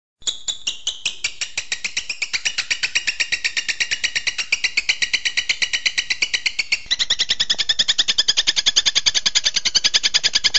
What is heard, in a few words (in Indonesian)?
kombinasi tren cendet